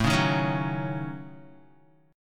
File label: A 6th Flat 5th, Guitar; 4,4,3,6,6,x; strummed